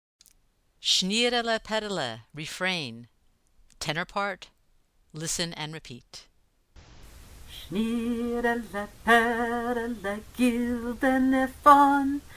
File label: tenor drill; Shnirele Perele